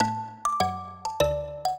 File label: minuet, mbira; Mozart